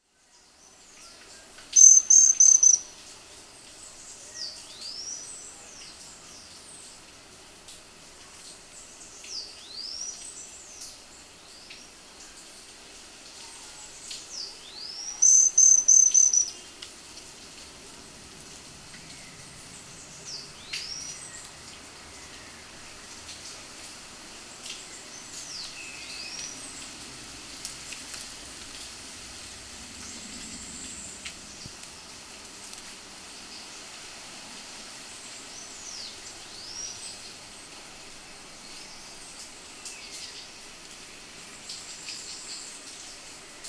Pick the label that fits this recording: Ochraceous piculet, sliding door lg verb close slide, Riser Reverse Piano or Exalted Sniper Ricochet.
Ochraceous piculet